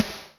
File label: S Gate, Electric Snare, Yamaha DTXpress